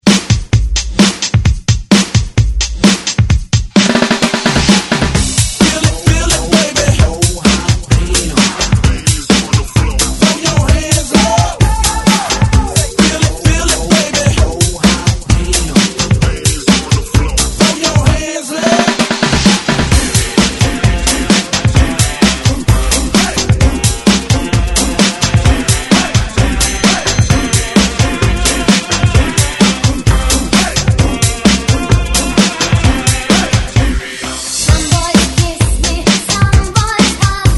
80s Hype ReDrum

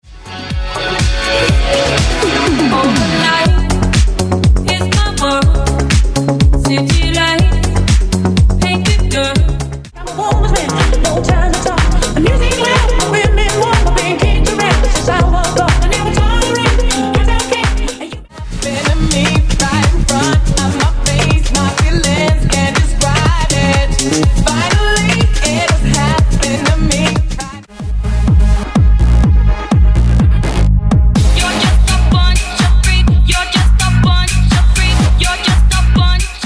70s 80s 90s Dance House Megamix; Megamix v2

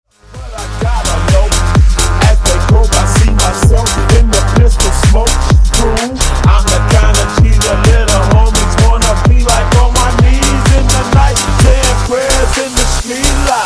Funk 128Bpm

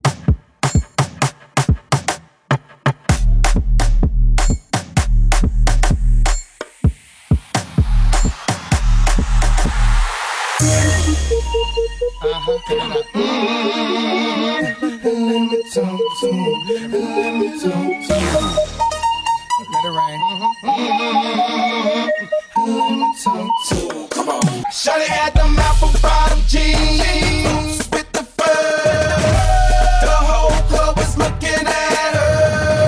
Basshouse Bootleg 128 bpm; remixplanet

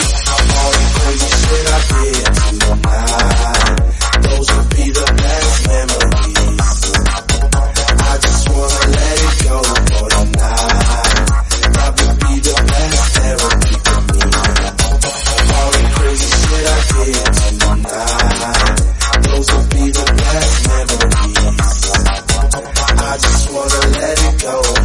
Tech House 128bpm

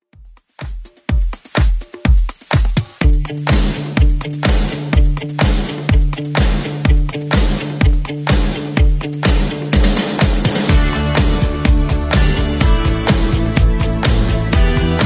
80s Club Edit 125bpm; remixplanet

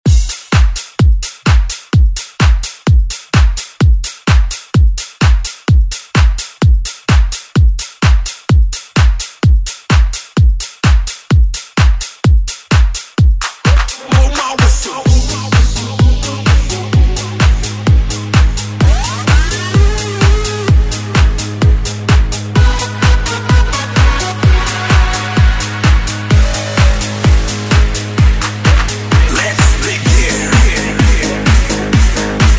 Dance Redrum

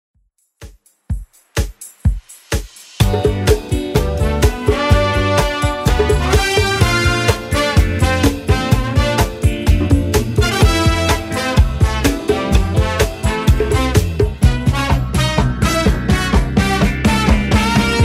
70s Redrum 126bpm

